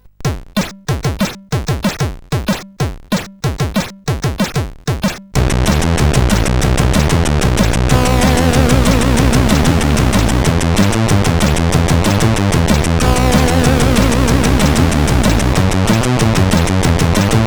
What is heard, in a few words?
Mean Rock, sid CSG8580R5